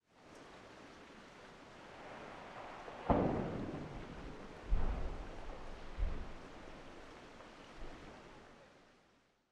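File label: Redwood Creek Tree Falling; muwo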